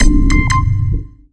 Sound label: wrong